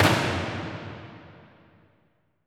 Mahler Hammer; percussion; Cymatics - Infinity Beta Pack 2.0; Zeus Percussion Collection

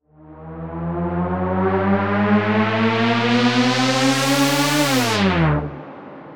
Terror Riser D, Dubstep; FX; Risers; Cymatics; Dubstep Starter Pack

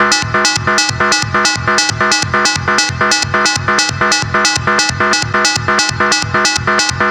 Synth Loop E 135, Techno; Ghosthack; AC2024 - Day 1 - Techno Sounds 2025

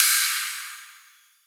Ride, cymbals; Cymatics; Future Bass Starter Pack; Drums - One Shots

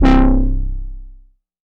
Moog Model D BASS Future Low - C; Synth; One Shots; Cymatics; Fantasy Synth Sample Pack

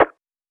Lofi Snare

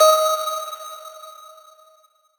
Lead Shot D# Crushed; Ghosthack

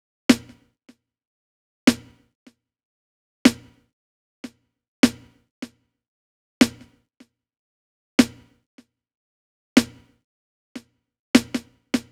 Drum Loop, 152 BPM Snare; Loop Stems; Cymatics; Vibes II Drum Loop Collection; For You Drum Loop

